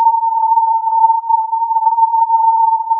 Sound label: filtered input